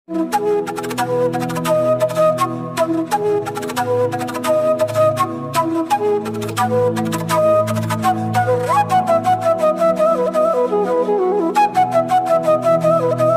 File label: flute bgm flute ringtone; krrish; krish movie